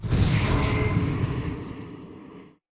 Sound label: boost jet body; Titanfall 2